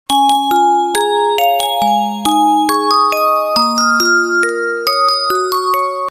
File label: Melody Bells